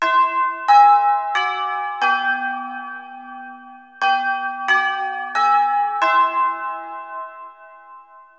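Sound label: chimes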